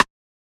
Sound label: Percussion Shot; Percs; Cymatics; Astro Tint